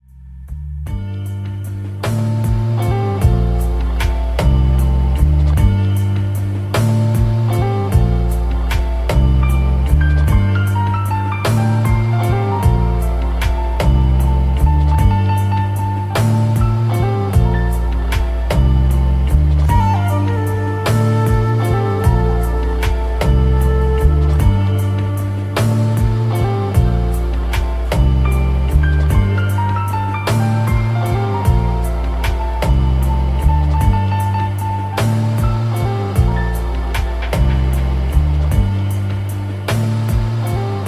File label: Blues